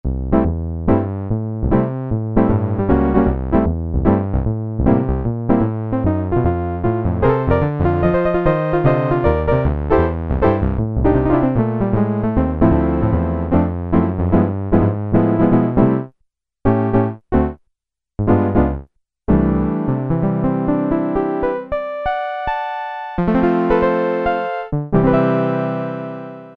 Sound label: Acoustic Piano, Korg Poly-800